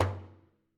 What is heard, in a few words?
Hit Muted, Frame Drum; Membranophones; Struck Membranophones